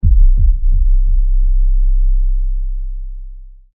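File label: sounds for trailer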